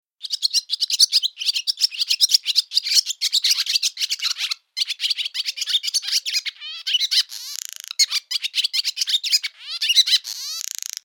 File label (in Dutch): vogel